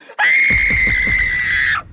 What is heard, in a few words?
screaming